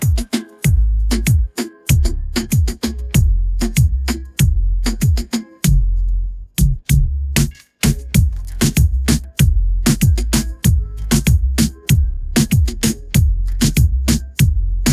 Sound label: latin reggaeton beat